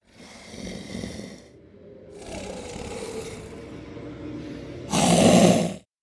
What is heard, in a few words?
zombie detection